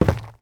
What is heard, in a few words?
zombie footstep wood; DeadFright